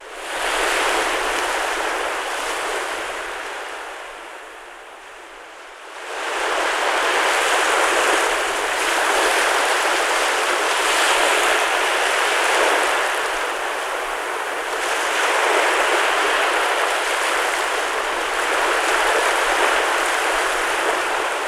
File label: Ocean Wash on Beach; Nature Ocean Sounds